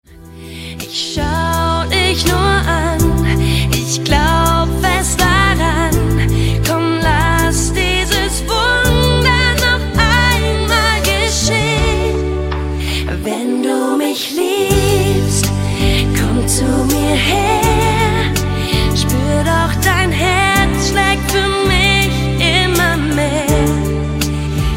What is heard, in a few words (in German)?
lied